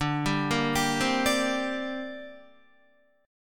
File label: D 7th Suspended 4th Sharp 5th, down, Guitar; D7sus4#5; arpeggiated; JGuitar